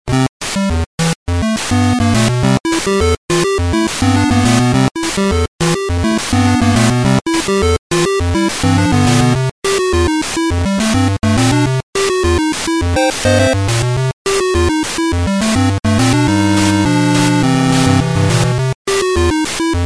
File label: 8bit; super mario